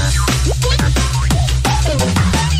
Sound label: funny song